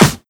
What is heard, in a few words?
Snare Trap; pitchglitch